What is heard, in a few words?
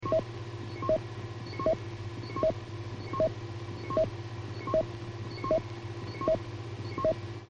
panning